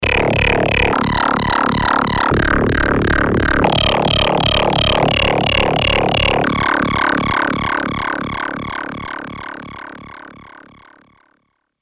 fx ratling, Polivoks; Polivoks formanta

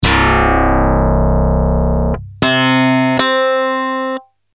dx7; yamaha; synth

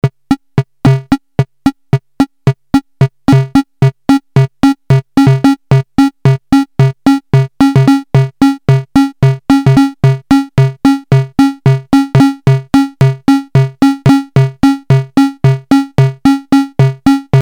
arpeggiator swing mode, Novation Bass Station II; analog monophonic machine with subtractive synthesis

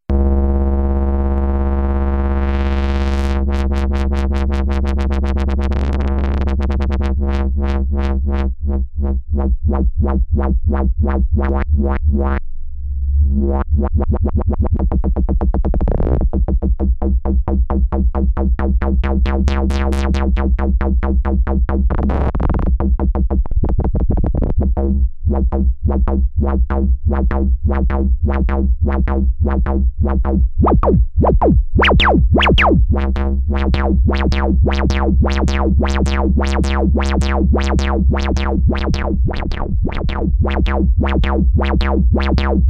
exte lfo, nanozwerg; test: external LFO; MFB nanozwerg

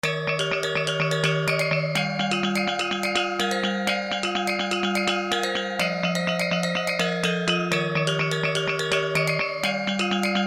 gamelan, korg n1r

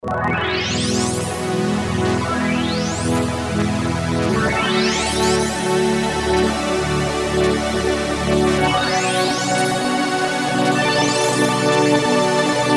pad modulated, minimax; Creamware minimax

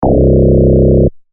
bass sound; waldorf rack attack

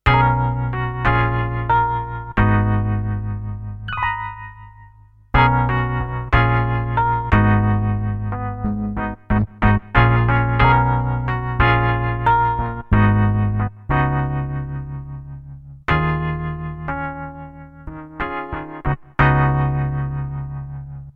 Warm Rhodes, Korg DSS-1; main core based on 8 bits CPU Intel 8085 manages 8 voices polyphony

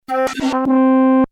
pcm, roland jd800; synth

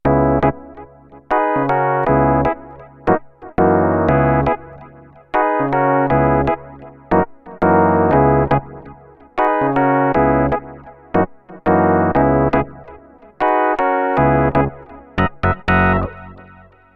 piano fm, access virus

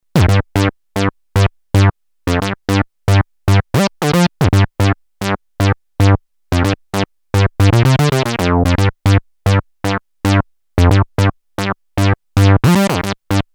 synth solo, Moog minitaur; analog monophonic synthesizer

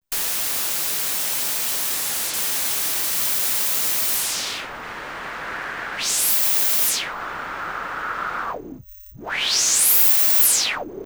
white noise, Polivoks; Polivoks formanta

